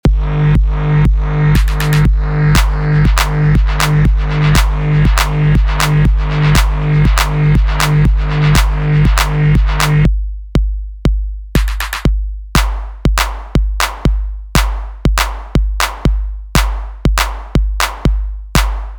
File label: inline sidechain